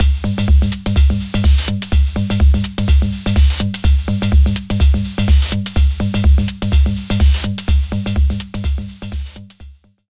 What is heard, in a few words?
Rolling Ride; D16 Group Audio Software Nithonat 2